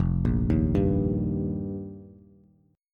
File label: F 7th Suspended 2nd Suspended 4th, Bass; F7sus2sus4; arpeggiated; JGuitar